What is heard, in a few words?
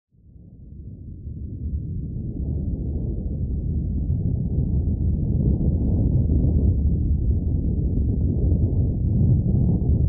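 volcano eruption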